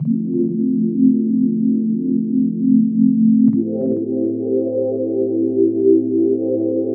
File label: Bpm138 Heaven Pad Loop D A, Dubstep